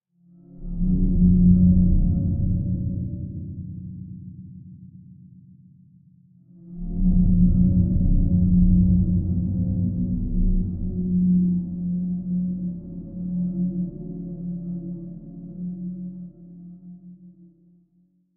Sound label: suspense; DayZ-Epoch; dayz sfx